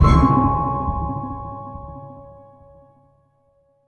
sting Shock; the walking dead michonne 2 give no shelter

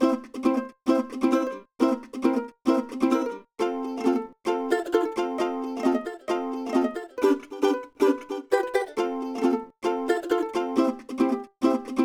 Ukulele; Disney Friends; Nintendo DS

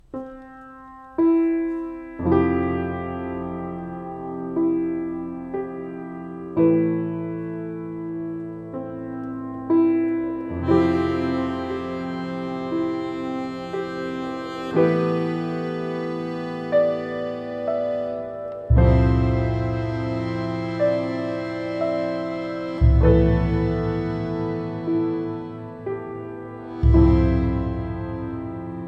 Piano Theme Big; windows gamerip 2016; gnomes vs. fairies

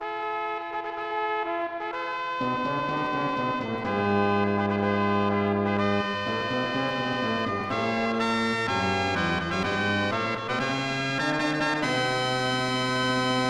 Unknown Jingle; Haisei Mahjong Ryouga; SNES

